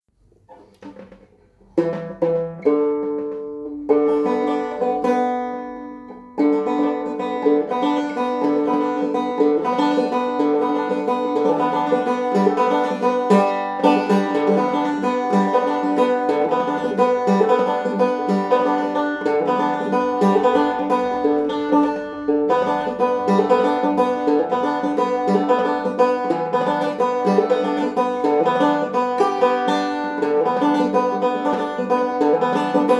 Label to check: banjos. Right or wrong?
Right